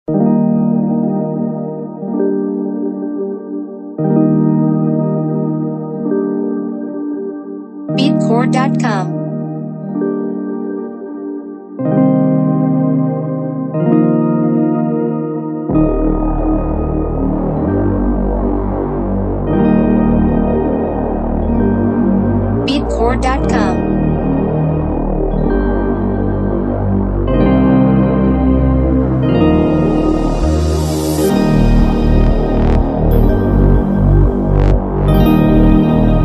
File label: uplifter